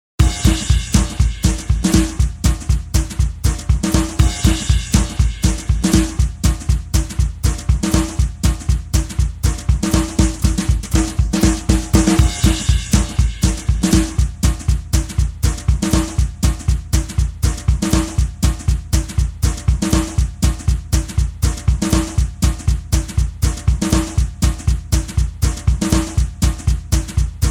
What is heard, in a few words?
country field drums